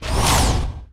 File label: Interface